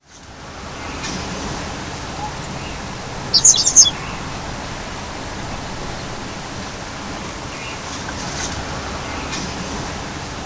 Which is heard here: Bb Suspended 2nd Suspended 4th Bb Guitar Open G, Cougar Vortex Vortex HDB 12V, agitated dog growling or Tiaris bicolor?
Tiaris bicolor